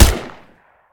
weapon; Sanguine

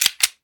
reload; spawning in/getting ammo from something external

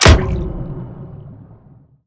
weapon; Collosal

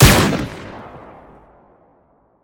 weapon; ARISAKA

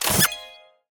weapon; LOVESTRUCK

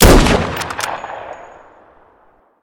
weapon; Lunar Eclipse

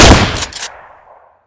weapon; Revolving Shotgun

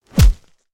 punch

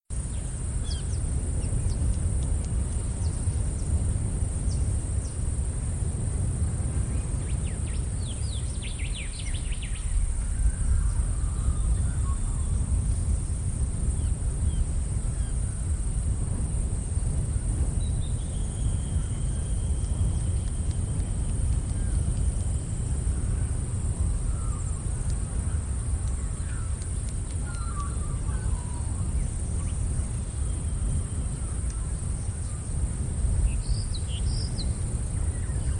ambient

